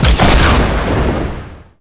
rocket explosion; cstrike; pubg

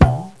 jump; cstrike; next21 kart

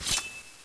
knife deploy; cstrike; codmw2

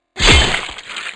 katana knife stab; cstrike; weapons; knifefun

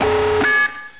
nuke alarm; cstrike; codmw2

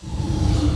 teleport

